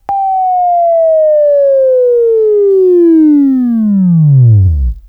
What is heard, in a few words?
Buzz, Left